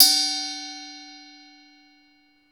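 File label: ART SHELL, percussion metallic; Rarefaction; Poke in The Ear With A Sharp Stick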